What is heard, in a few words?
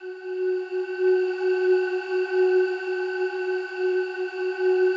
slow strings F#3, Yamaha VP-1; Music Store; 90's Sample CDs